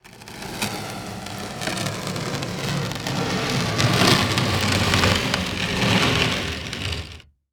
Foley Movement Cinder Block Slide On Wood Floor Slow